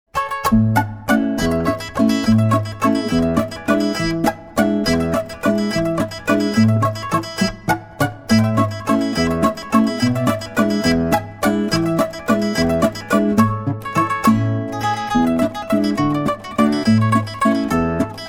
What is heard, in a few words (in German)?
Scherrzither Gitarre Walzer